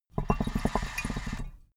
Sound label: water pipe puff bubble short